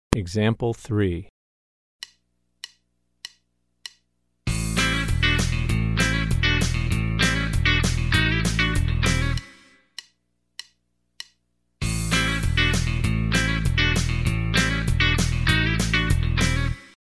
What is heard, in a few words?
Country Rock